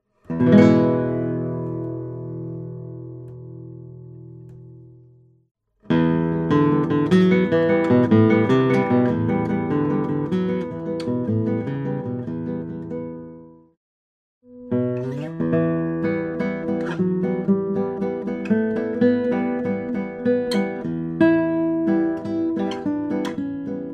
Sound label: classical prototype maple, guitars